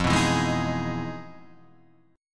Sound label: E Major 7th F; strummed; Emaj7/F; JGuitar